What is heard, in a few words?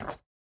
stone step, footsteps